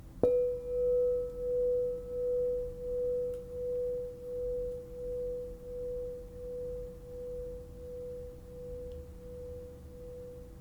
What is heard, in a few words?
5.5 inch B +5, crystal bowl